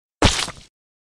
splat sound effect

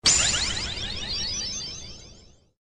donation alert

